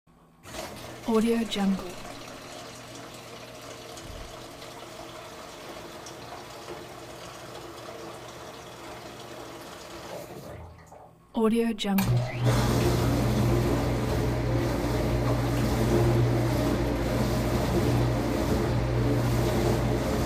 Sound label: dishes